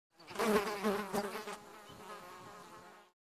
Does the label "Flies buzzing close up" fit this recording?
Yes